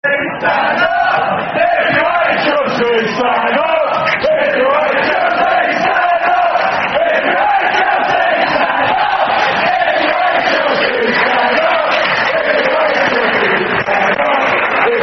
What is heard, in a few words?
Stand Up If You Hate Chelsea, Football Chant (Soccer Songs), Fulham